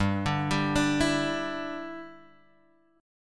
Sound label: G 5th Add 13th G Guitar Standard, chord